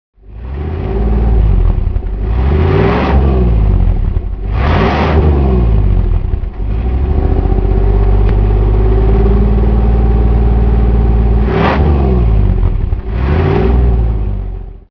Rolls Royce Silver Cloud III LWB, 1965, Engine sound; V3D/ ClassicarImages, Marc Vorgers